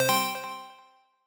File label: Positive Sound